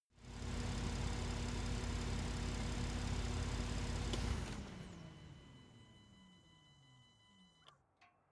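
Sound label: sports car exterior engine shut off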